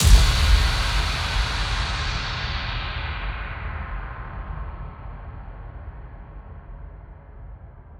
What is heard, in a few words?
Impact Current